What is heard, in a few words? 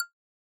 Default button; Syrup 2 Candy Alchemy RPG